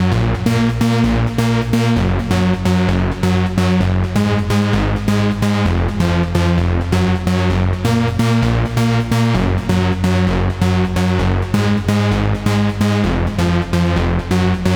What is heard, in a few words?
NRG Bass, Trance